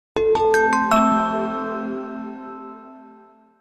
Message, Digital; Nokia C7

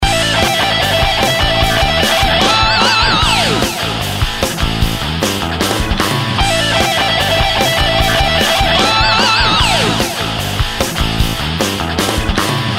Down Hill, ringtones

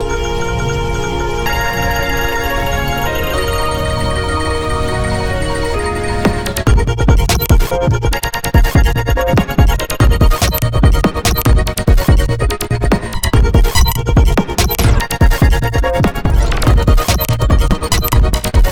Ethereal vibration; Google; Google Sounds; Gems

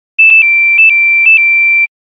Nokia Buzzer City bird

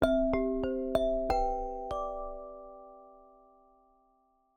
Lullaby

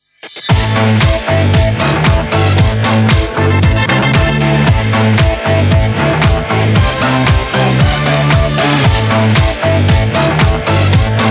Glitter, Nokia Tones; Windows Phone apps

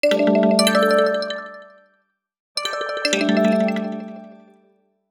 ringtones; Gardenia; HTC One M8

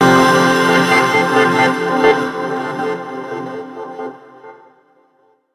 alarms; Icedream; HTC One M9

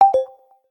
Key End; LG Wine Smart F480K

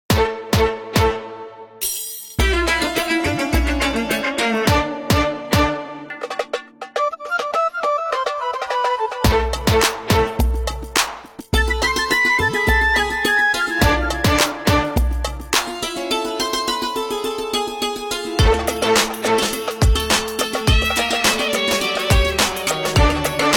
Rai Fusion, ringtones; Nokia; Asha 500

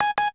Beep Zoom In, Camera Control Sound; SKY IM-S400L; System Sounds

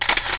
camera; Coolsand

